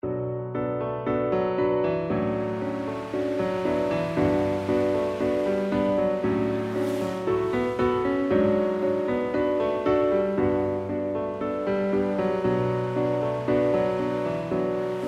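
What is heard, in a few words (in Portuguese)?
Canto a Iemanja, port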